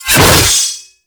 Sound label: Drill Scythe Whoosh; Warframe; Grn Drill Scythe